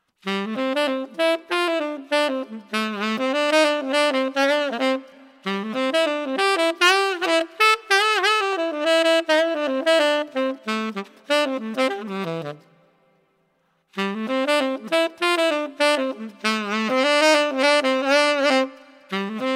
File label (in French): saxophone